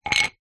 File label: foley wood small block thin light down on hard ground; zapsplat